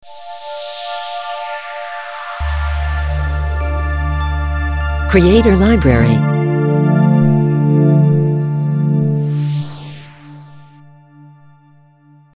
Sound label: Logo; Logo-Idents; wmusic